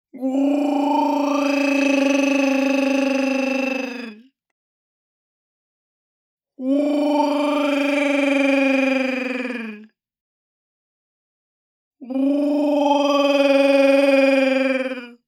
ALIEN CREATURE GROWL FUNNY THROATY LONG LOW TO HIGH MOVEMENT, The Mouth